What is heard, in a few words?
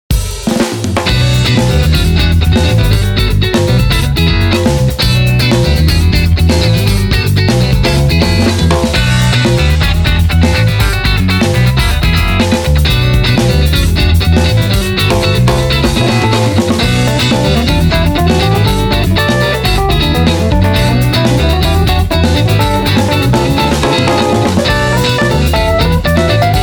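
guitar test new pickup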